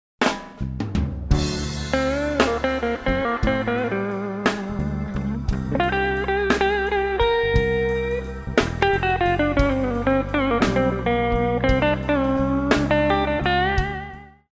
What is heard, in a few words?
blues